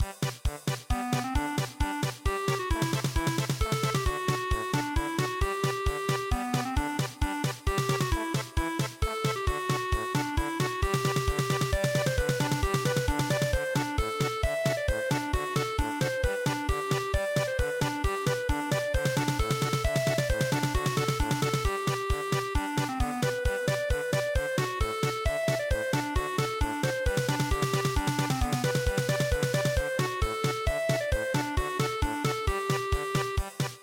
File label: polka